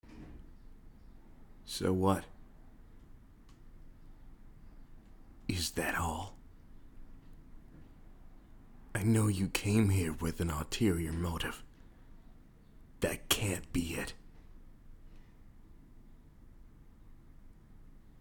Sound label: Soft Kisses; Eren; In Your Neck